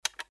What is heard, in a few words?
click